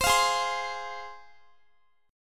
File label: G sharp 6th G sharp down Guitar, G#6 chord; strummed